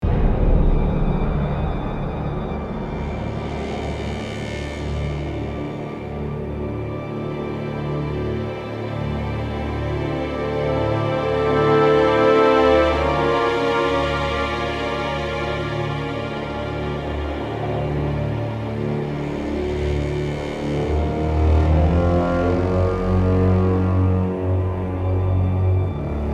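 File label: Music Snow Explore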